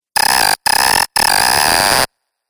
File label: Glitch Scratchy And Broken